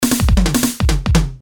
Fill 174

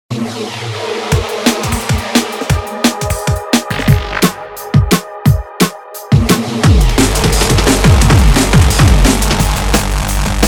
Neuro Funk Breaks

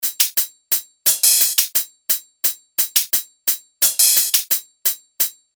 Cym Hi Hat 174

